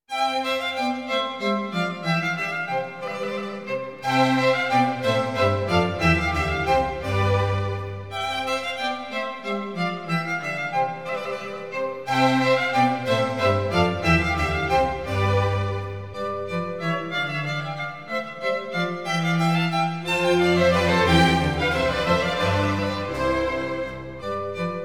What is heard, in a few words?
menuet; corelli arcangelo